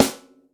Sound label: steel snare drum c key, Royality free steel snare drum sound tuned to the C note.